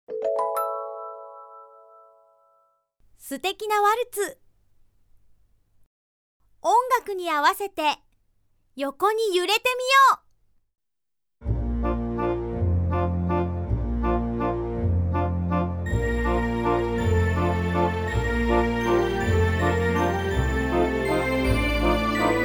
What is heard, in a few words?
walz